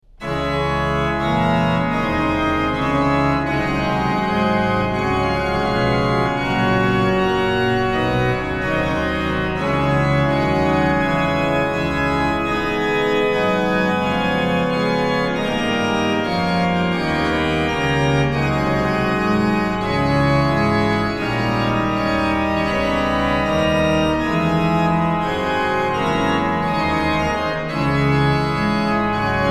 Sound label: Plein Chant; F. Couperin